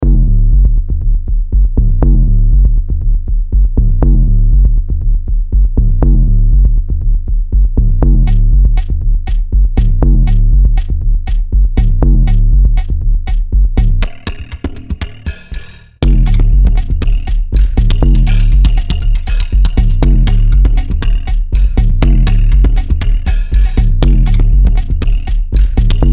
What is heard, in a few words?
soundtracks, 120 bpm